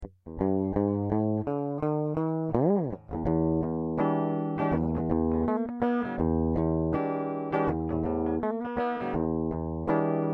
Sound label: Wah Clean